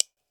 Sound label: tone; seasonal